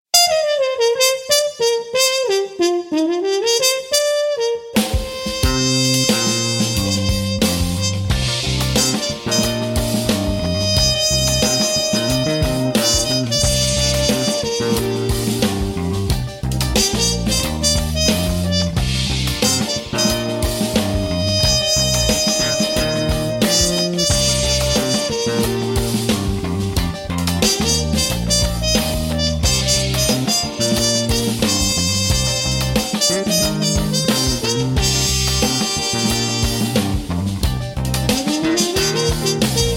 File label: Funk